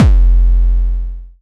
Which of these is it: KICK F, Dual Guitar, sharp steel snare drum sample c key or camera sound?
KICK F